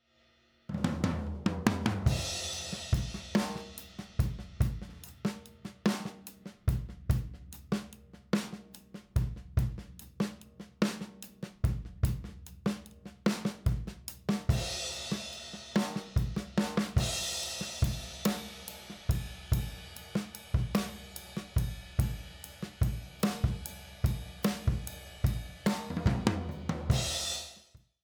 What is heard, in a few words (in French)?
drums avec